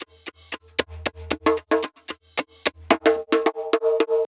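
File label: PERC 1, 112 bpm, Funk; USB Soundscan vol.41; AKAI; Roots Funk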